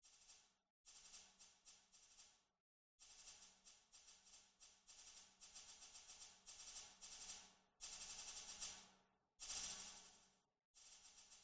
Snare Track

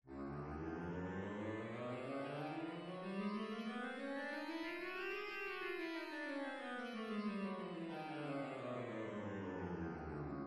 Bari Sax Chromatic

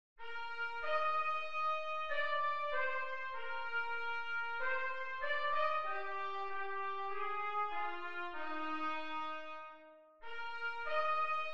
Trumpet Track

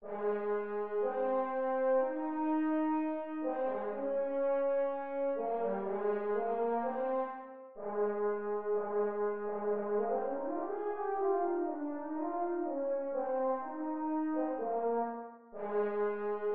Horn Excerpt

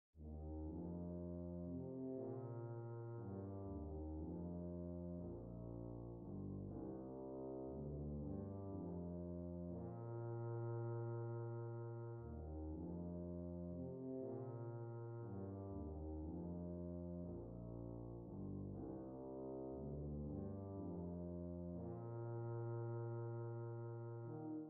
Tuba Excerpt

